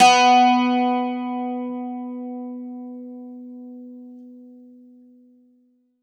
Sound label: bouzouki